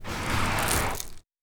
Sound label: Sand gravel scrape